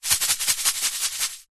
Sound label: food sugar bag shake